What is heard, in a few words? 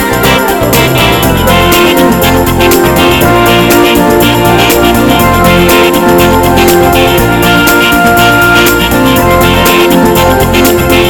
A Hot Pop